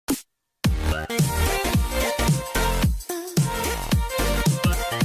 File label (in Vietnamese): Nhạc intro 5s